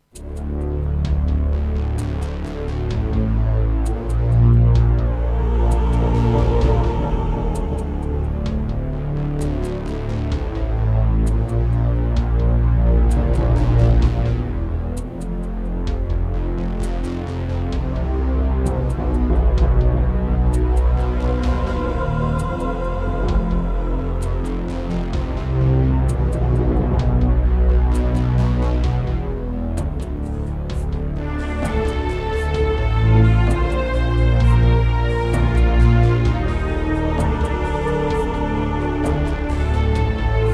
Roland SC-8820; Descent II - Briefing

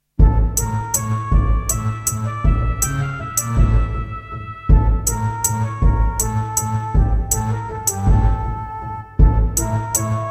Midiplus MiniEngine USB

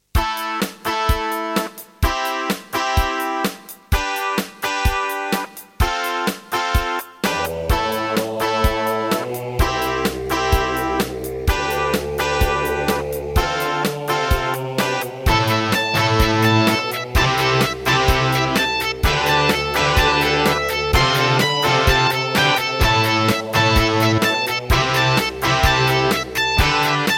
Roland SC-8820 88MAP; raptor call of the shadows

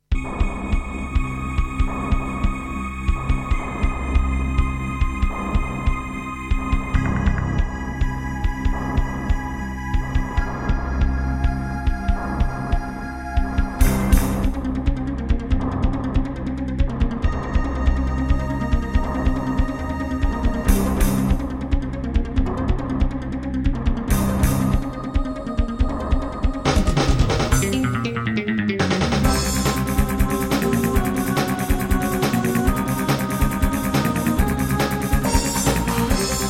EMU Sound Engine; sigil doom episode 5

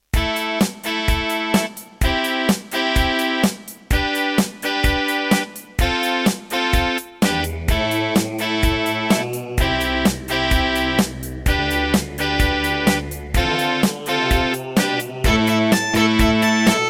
Yamaha DB50XG GSMODE; raptor call of the shadows

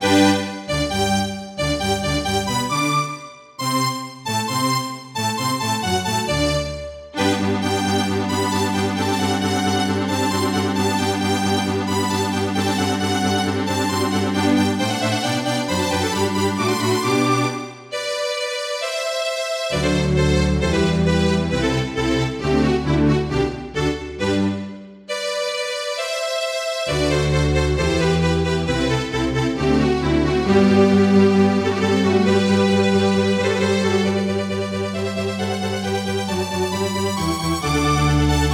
Casio CTK-800; classical; Mozart; Eine Kleine Nachtmusik partial

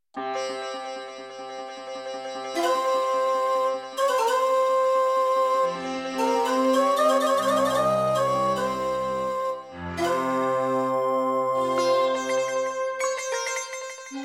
Roland SD-90 GM2MODE; Prince of Persia 2

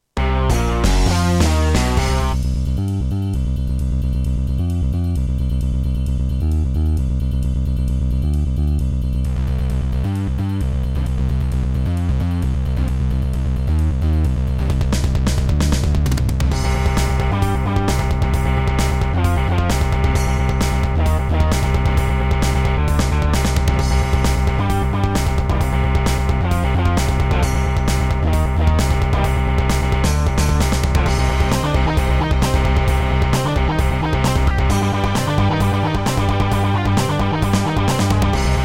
Roland SonicCell GM2TEMPLATE; sigil doom episode 5

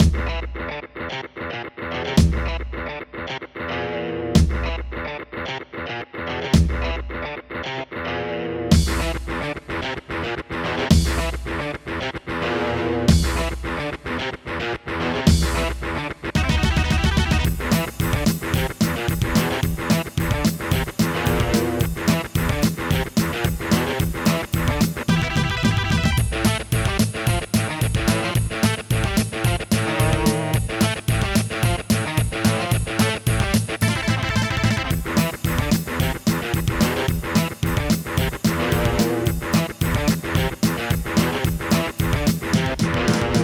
Casio CTK-800; Doom

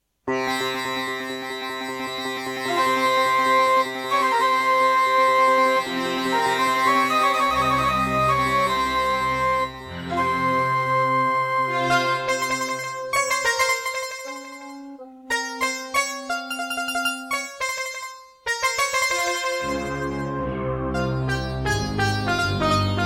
Intro, Serdaco Dreamblaster X2GS BURAN V1.1; Prince of Persia 2 - Intro

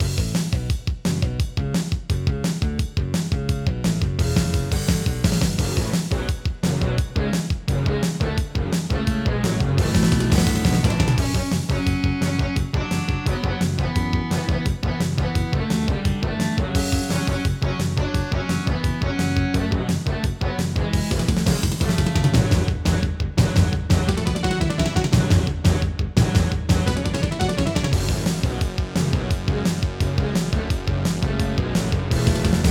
Yamaha DD-55; Duke Nukem 3D

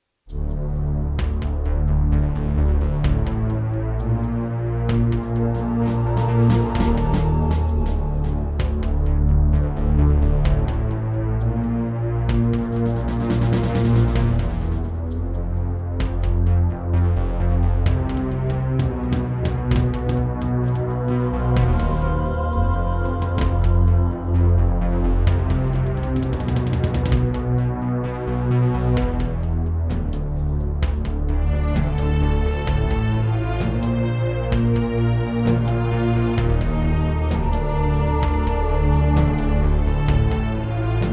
Miditemp DoX-1; Descent II

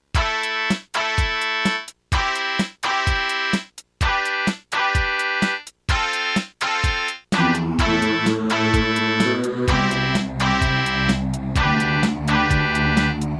Gravis UltraSound 1MB Rev.3.4; raptor call of the shadows

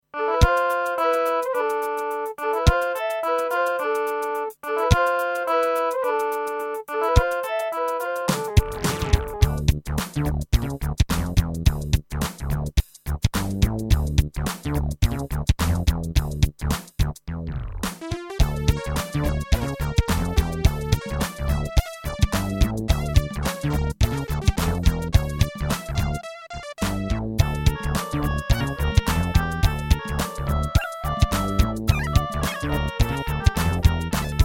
V7 media fx

